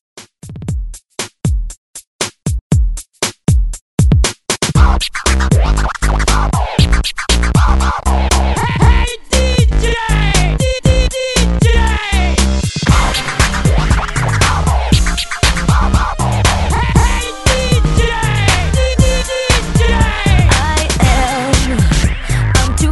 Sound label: Hype Scratch Re Drum 118 Bpm; DJDOLLS; remixplanet